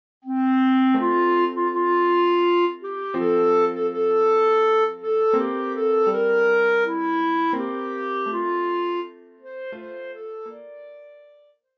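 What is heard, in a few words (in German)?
Bassklarinette; O Tannenbaum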